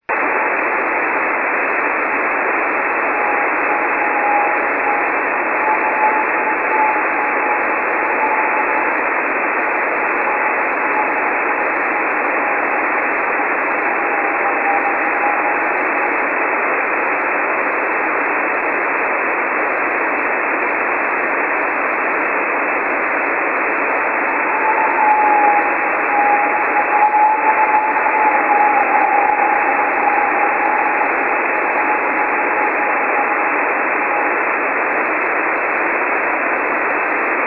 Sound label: bursts, 49.757 video, short burts; 49.757 video from Murmansk was also in that evening. At first it came in waves of a few minutes each. Later is was in short burts.; 6 Meter; N1BUG